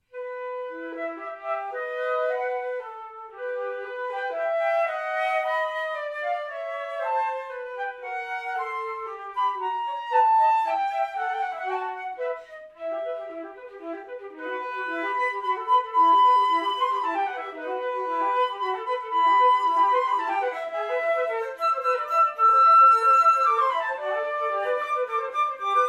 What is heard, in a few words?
Allegro; Friedrich Kuhlau